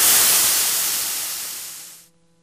imp bullet energy default wash; Titanfall 2

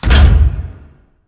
pod door sm verb open stop; Titanfall 2

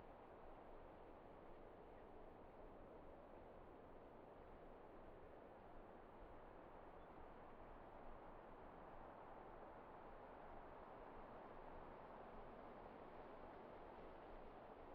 int closed room; Titanfall 2

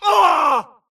grunt death crush; Titanfall 2

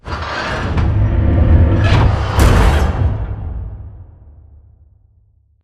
Conveyor Plate Slide Lock; Titanfall 2; Boomtown

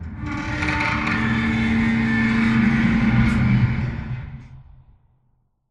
crane movestart metalstress; Titanfall 2

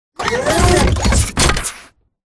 dodge left; Titanfall 2

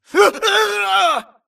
grunt electro; Titanfall 2

